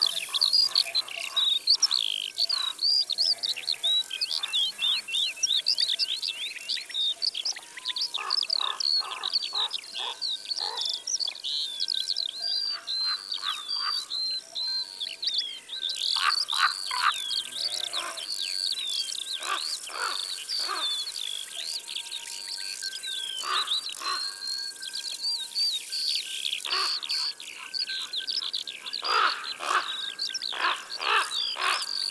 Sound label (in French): Grand corbeau; abc de la nature